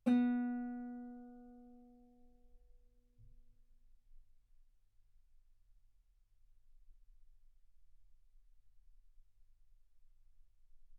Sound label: Guitar ff B3; sul B